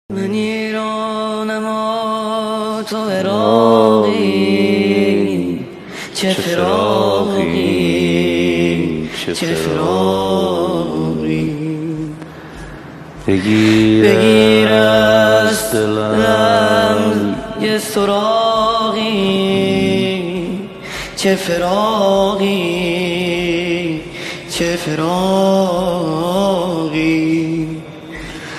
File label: nohe sedaye nojavan